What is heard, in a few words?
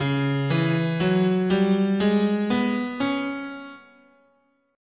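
D flat Blues, guitar, scale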